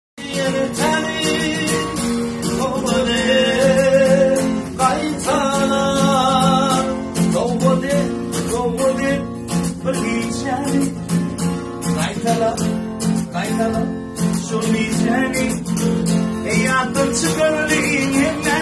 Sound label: türkmen gitara